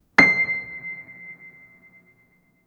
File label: a piano key pressed from